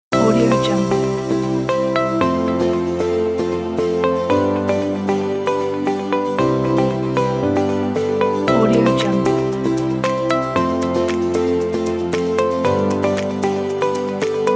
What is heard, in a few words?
joyful story; magictunesound